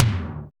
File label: aggro tom; Drums In Your FACE!